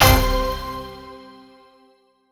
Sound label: nudge complete